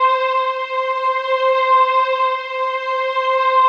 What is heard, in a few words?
String Box, евродэнс